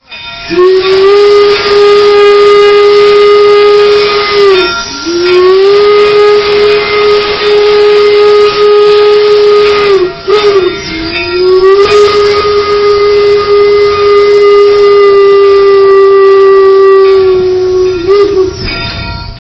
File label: steam Whistle